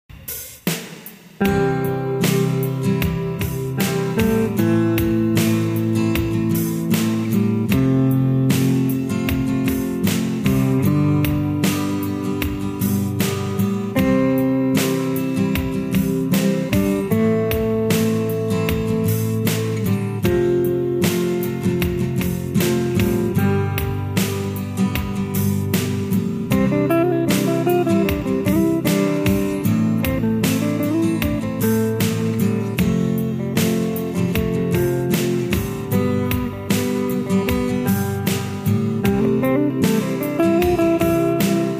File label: lead; progression using the chords C Am F and G